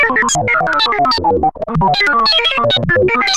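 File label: analog synth computer; 1000fx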